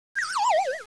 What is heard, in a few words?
fall